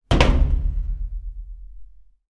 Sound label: industrial door metal close; zapsplat